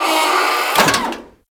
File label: Fuel Injector Open; Warframe; Missions; Sabotage; Sabotage Grn